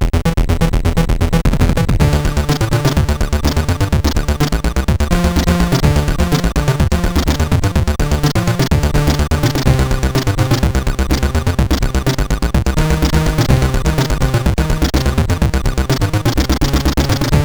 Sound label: PAL, sid CSG8580R5